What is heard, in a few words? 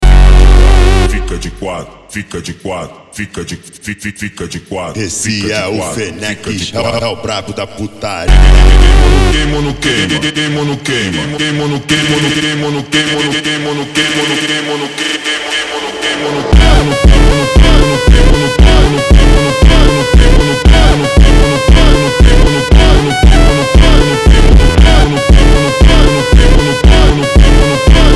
Slowed